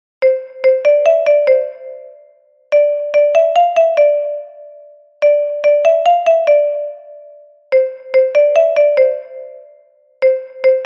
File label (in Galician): Xota Xilofono Soprano